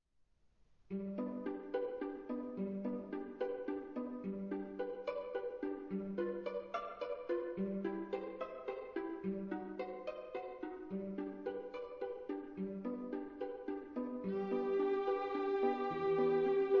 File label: String Quartet; Ave Maria